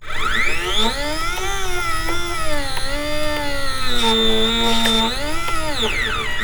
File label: FLUCTU SER, Cyber Boog Servo; E-MU Producer Series Vol. 3 – Hollywood Sound Effects; Science Fiction